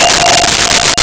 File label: Vibes F5; Nintendo; July 2020 Gigaleak